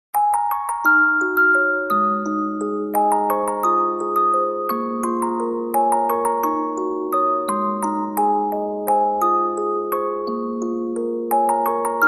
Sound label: Music Box